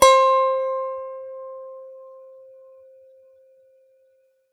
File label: lap harp pick C5; Ethnic